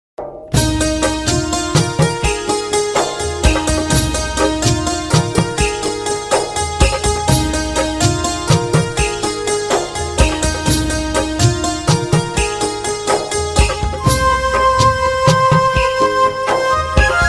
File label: chhath puja ringtone chhath tune ringtone